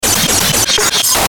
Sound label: Glitch Sequence Harsh Hiss, Glitch Chaos Blurp; Production Element Imaging Element Accent Transition; Blastwave